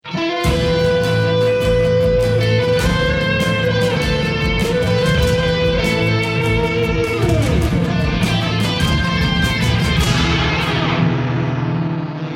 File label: round ten sec count